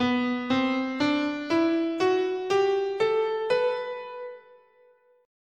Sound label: B Phrygian